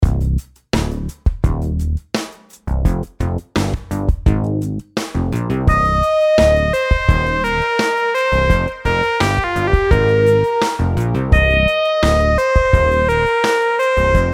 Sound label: rhythm simple 8s triplets